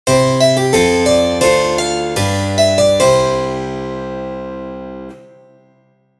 教会 祈り チェンバロ; モノラル

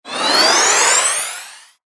タメ モノラル

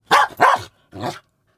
犬の鳴き声・その6

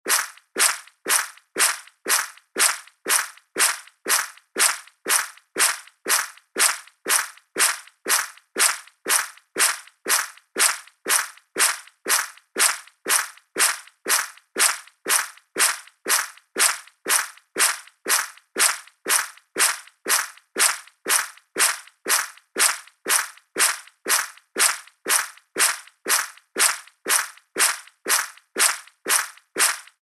足音 水辺 歩く; 足音の効果音

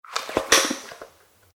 ボディーソープ

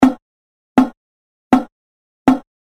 コッコッコッコ 木魚

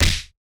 インパクト ピシ

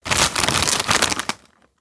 スナック菓子を袋から取り出す

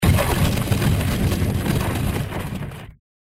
土魔法 大; 魔法効果音; RPGなどに使える効果音です

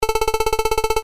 会話

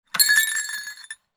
神社の鈴の音; 演出効果音

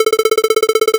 会話; nes retro talk sound effects

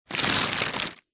巻物

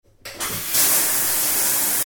シャワー・お湯を出す音 その2; 日常生活の効果音

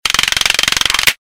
クロスボウ溜め

